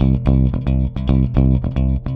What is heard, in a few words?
Rock, Bass; Bass X